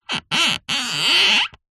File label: string rub wood squeak; foley